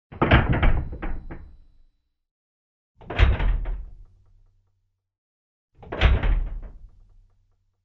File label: metal door closes